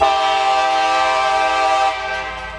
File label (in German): US lang 2